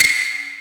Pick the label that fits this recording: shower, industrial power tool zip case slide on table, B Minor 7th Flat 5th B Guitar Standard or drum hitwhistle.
drum hitwhistle